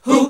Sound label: HAL GOSP. MIXED; Sound & Vision - Gigapack II CD 1 (Roland)